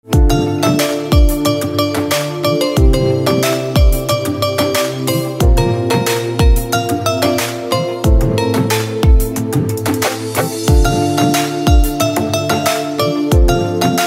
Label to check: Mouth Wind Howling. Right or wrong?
Wrong